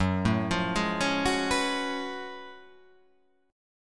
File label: F sharp Minor Major 11th